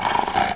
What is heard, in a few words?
HAHA